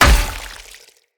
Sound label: Polearm Large Impact Flesh; Weapon Impacts; Enemy